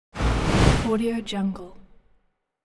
scifi wind whoosh; hollywoodedge

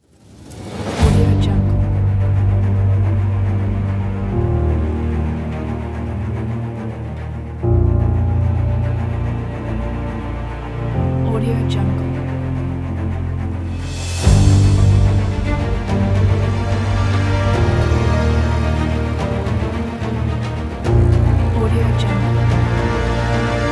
adventure epic trailer

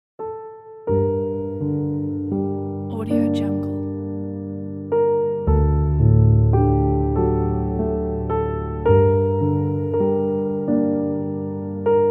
sad romantic piano; soundrose

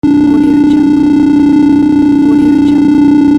typing computer

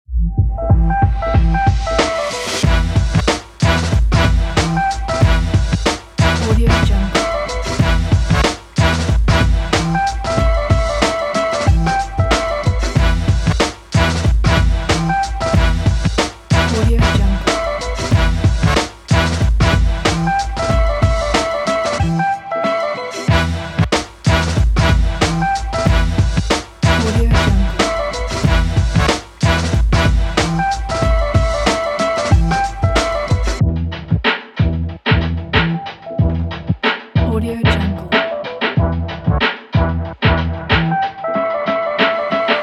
funky hip hop promo; marblespace

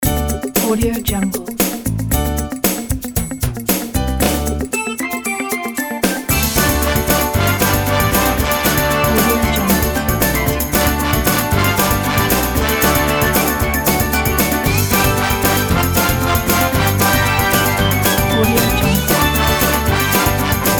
big band upbeat groove ident

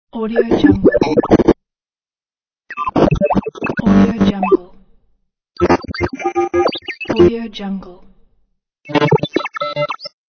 alien controls; alien controls pack

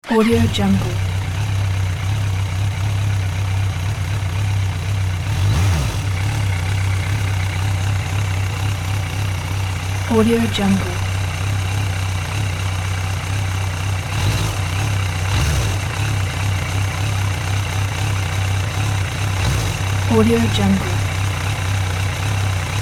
motorcycle idle with revs; sound-ideas

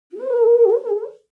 foley clean window paper towel slow howl; smartsoundfx

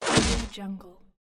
arrow; g0dgrace

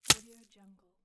flesh gut drop spill

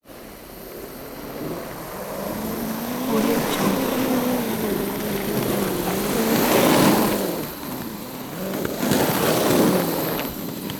roller skate constant circling single person

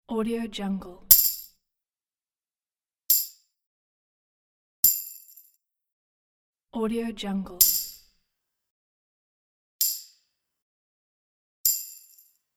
tambourine single hits

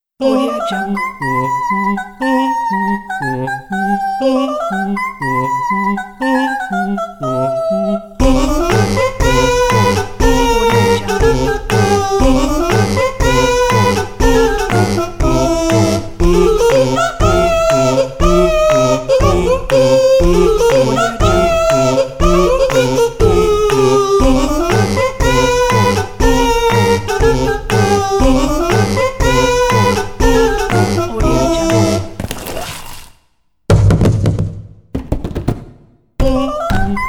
halloween kids

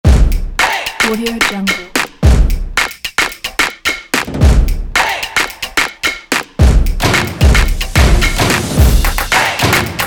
stomps

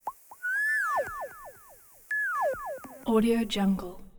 electronic beeps alien birds chirps

